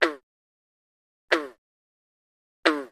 Frog Various

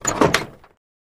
Car Old Door Open

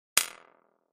Button Toy Drum Hit; buttons

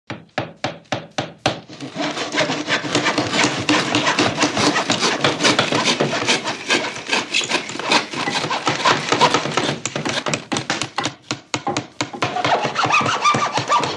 Close Up Hammer Saw, construction large

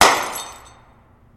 Light Bulb Drop Brea; lightbulbs

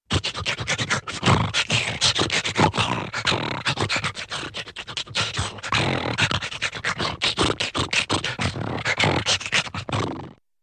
Large Dog Lion Sniff

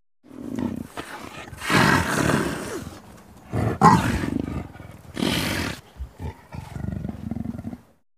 Leopard Snarl Inhale; big cats

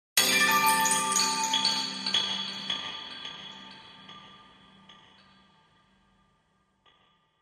Chinese Chimes Bells; mallets

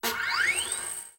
Fast Whine Up Cartoon, servos

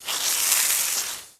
Water Hose Spraying A Tree, Medium Hard Start

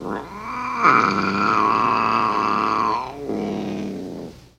Cat Angry Growl

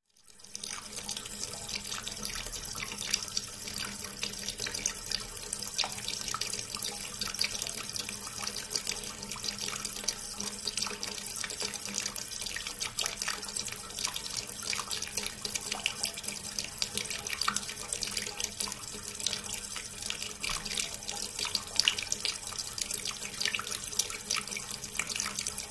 Wash Basin Metal, Trickle Into The Trap

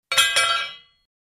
Hammer Drop Single; hammers

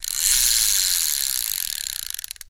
Fishing Reel Casting

